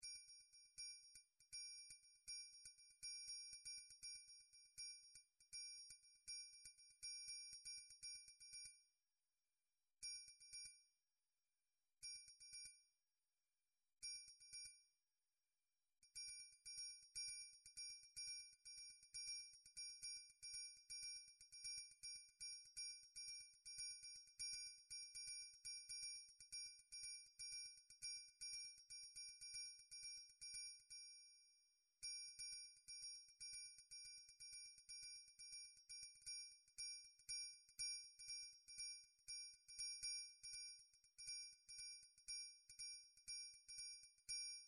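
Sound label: midi drums percussion triangle; midi vol 4 drums percussion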